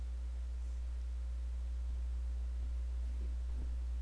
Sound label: sea